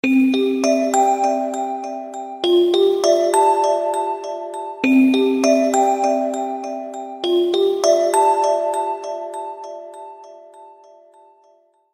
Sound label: Dreaming Tone, Samsung; zange samsung makhsose hamye goshihaye samsung